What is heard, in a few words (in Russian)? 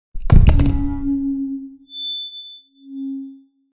uronili mikrofon